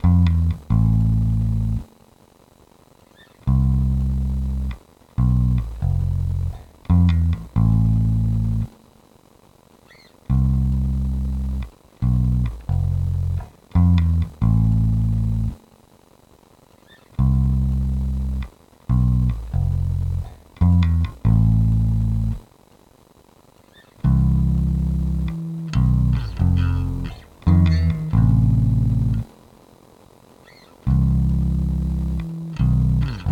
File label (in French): basse